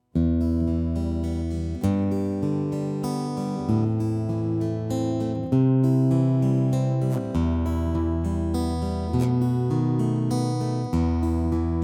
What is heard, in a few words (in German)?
Zupf; Zupfmuster 2; Begleitgitarre; Hörbeispiele Begleitgitarre